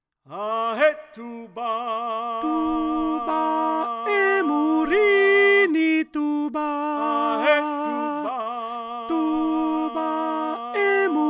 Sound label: tuba alto